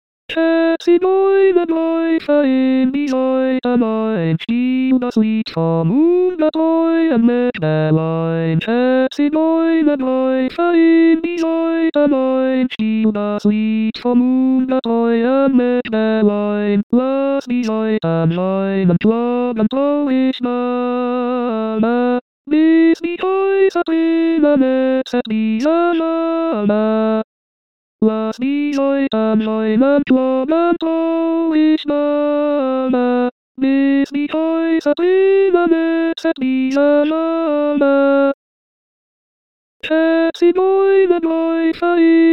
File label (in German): Zigeunerlieder ténor